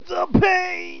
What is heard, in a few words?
PAIN